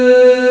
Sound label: vox; CMI